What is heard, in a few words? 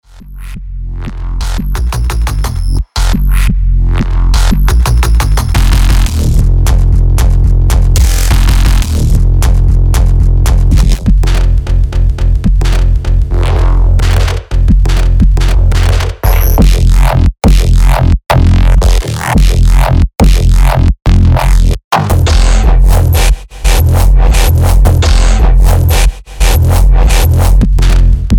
bass